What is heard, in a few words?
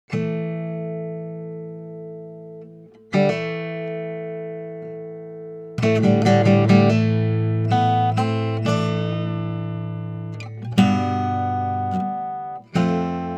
gibson g 30, guitar